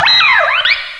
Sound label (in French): Cri